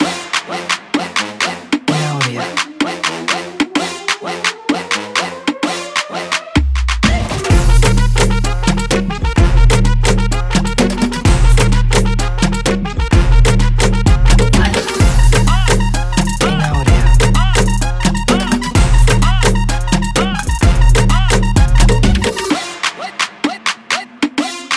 Brazilian Beat

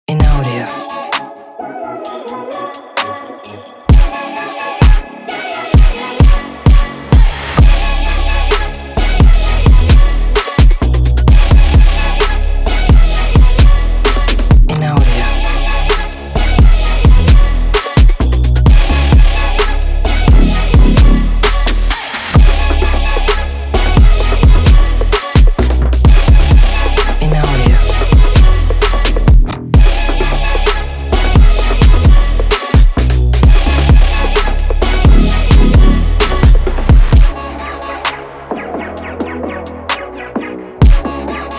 Asian Beat; Oddvision Media; Infraction Music

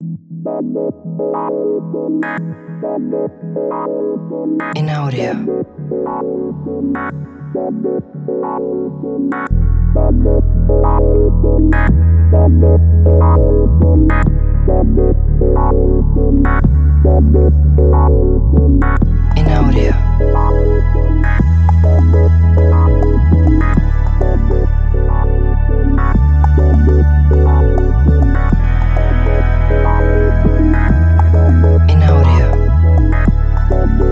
lounge

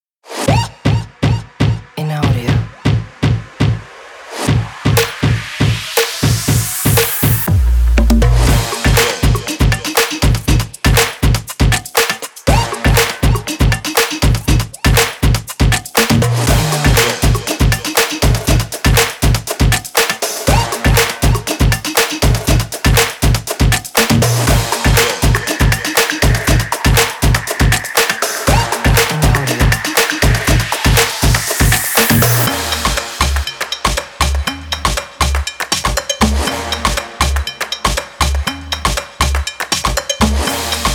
Percussion Drums; main version

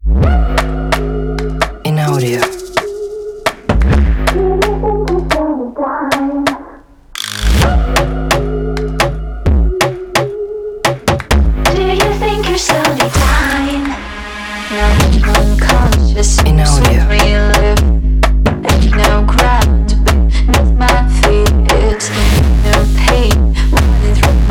Slowly Dying Brazillian Phonk

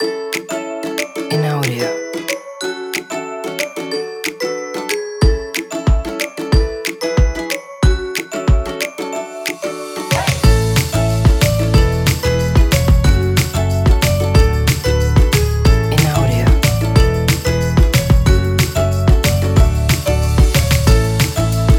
Ukulele

